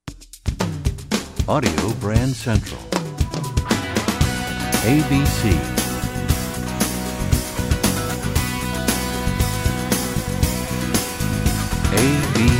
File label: Desert Wind, radio jingles